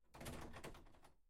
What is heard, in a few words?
Door Open; MiSide